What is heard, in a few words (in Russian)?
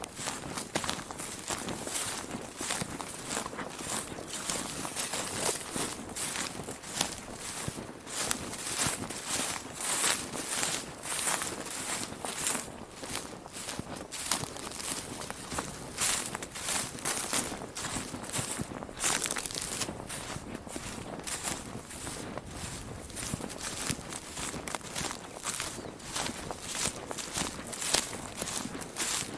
shagi po suhoi trave